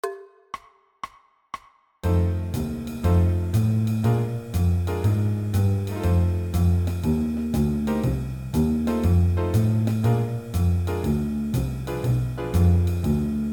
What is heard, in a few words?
Blues